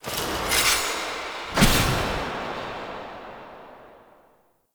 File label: Wandering Ghost Soldier Foley Spawn; Enemy